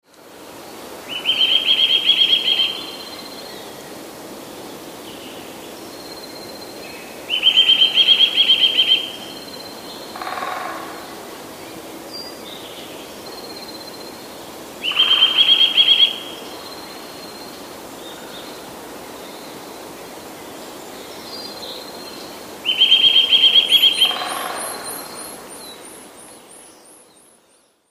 kowalik 4 tryle, 4 tryle kowalika, które nie są zbyt szybkie, ale brzmią nierówno

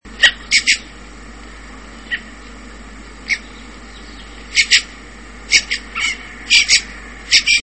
okrzyki droździka; turdus iliacus; wroblowe; turdidae

